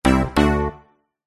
game sound musical warm bold retro 70s synth win award